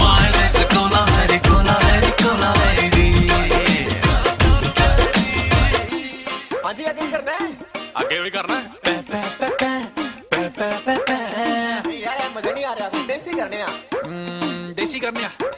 punjabi

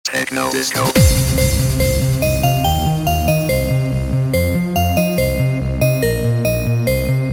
disco techno, ringtones

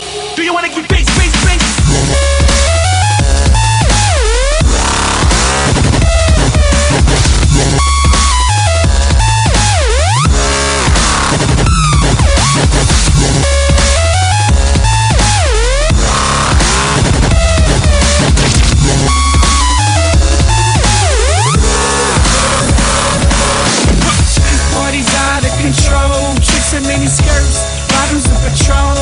Drumstep

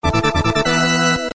Coin; Galaga REDUX

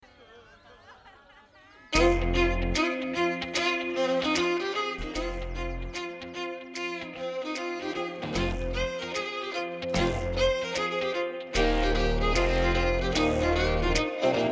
theme song

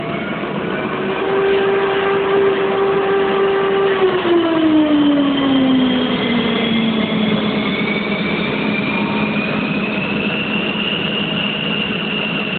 F-15 JFS Shutdown

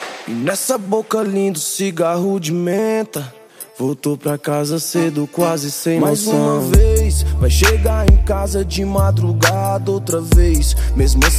Hip Hop

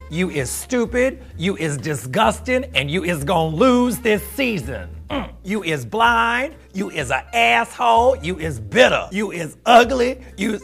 Is Calling RNB

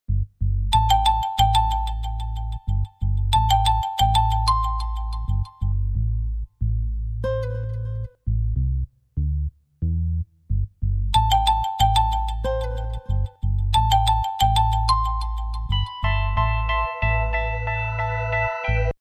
Hip Hop

